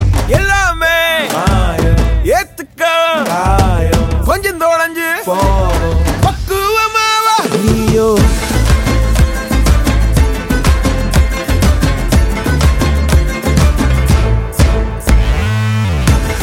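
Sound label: Theme background music Ringtone; Pathu Thala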